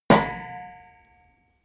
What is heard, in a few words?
metal impact girder